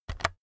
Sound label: keyboard external key press fast